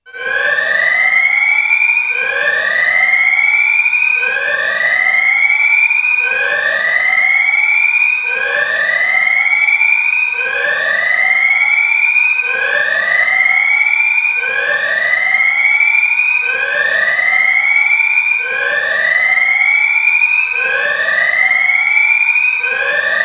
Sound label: Cortex Alarm; Headspun